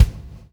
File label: PULL, Backbeat; Spectrasonics - Backbeat